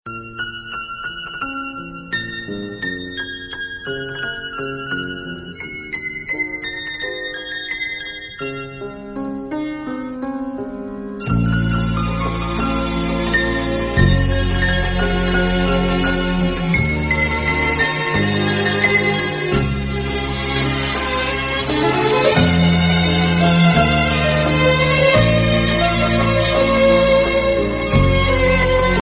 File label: tiger music